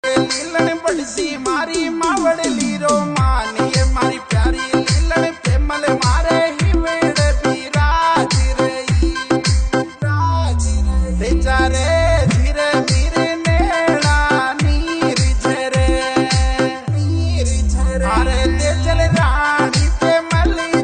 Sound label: Odia Song Ringtone